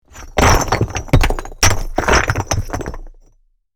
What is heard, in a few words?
Wall of small rock bricks fall